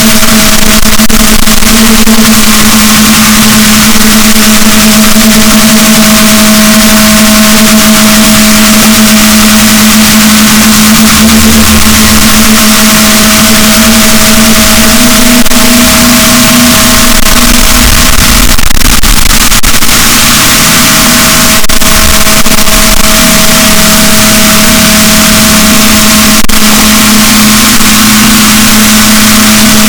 rorquals negatif, boat

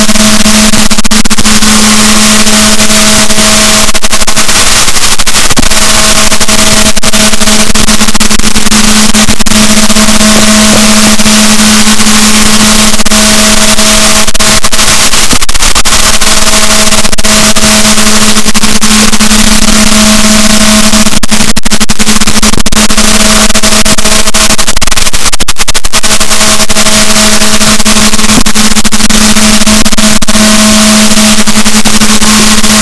rorquals, Balaenoptera Physalus, boat

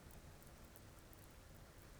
pulses, BALAENOPTERA; MONACO 2022-07

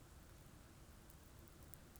pulses, BALAENOPTERA; MONACO 2022-07

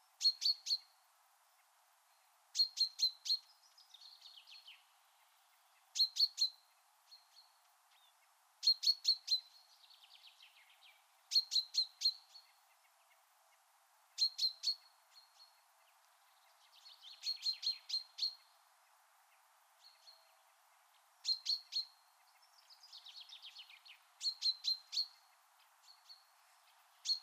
Fringilla coelebs; SETE

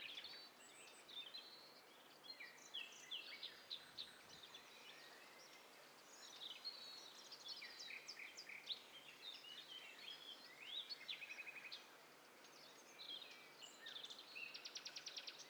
STEREO BIRD COCKTAIL PARTY

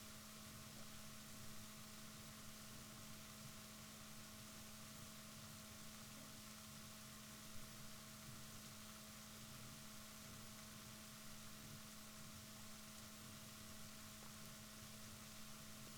rorquals negatif, Balaenoptera Physalus Chile

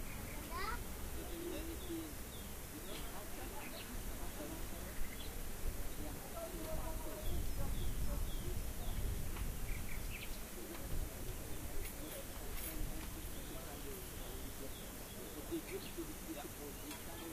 rayol farm; SEAMED

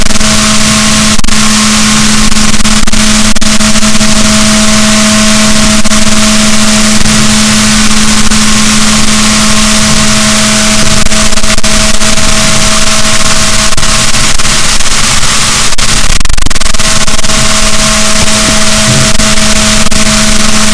rorquals positif, boat, BalaenopteraPhysalus; Balaenoptera Physalus Chile

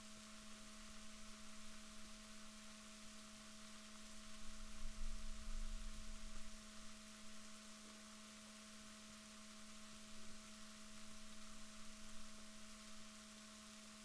rorquals positif, Balaenoptera Physalus Chile

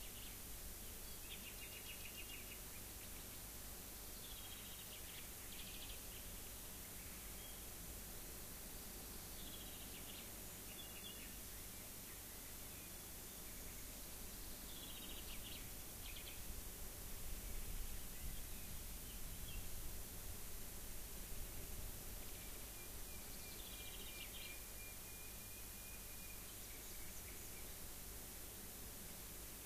rayol farm; SEAMED

